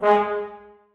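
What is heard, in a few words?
trombones staccato g2; Sonatina Symphonic Orchestra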